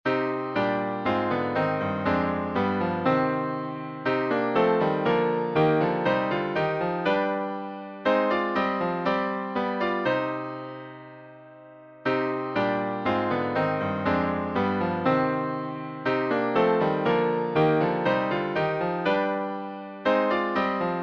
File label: Hymns